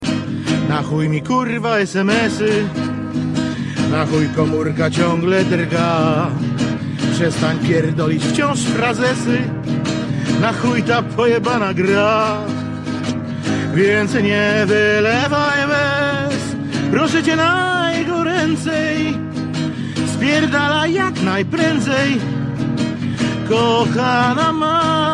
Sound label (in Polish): piosenka telefonisty